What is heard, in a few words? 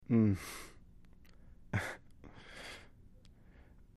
Breath Sigh Light; breathing